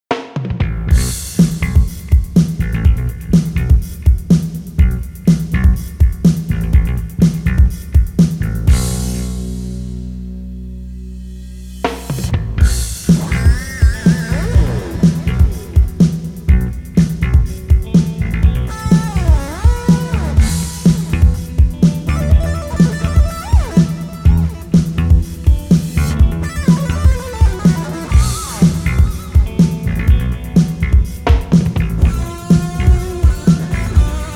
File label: Blues